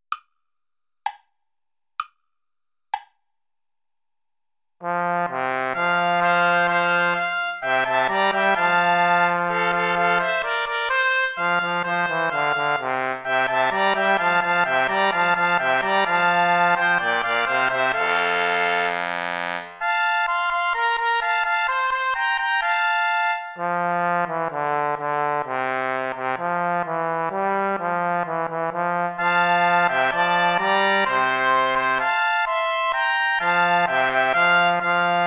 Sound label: play along without trumpet; praetorius michael psallite unigenito